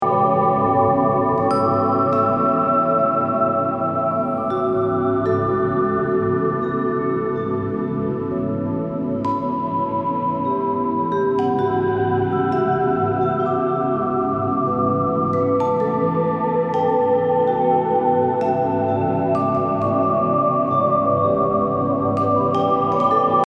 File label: Healing Melody